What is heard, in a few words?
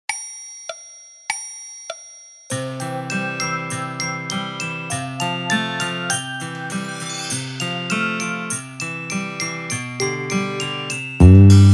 in C Orchestra Version; Twinkle Twinkle Little Star